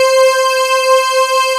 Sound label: FINE G5, Roland, Analog; USB Soundscan vol.49; Analog Fantasies; AKAI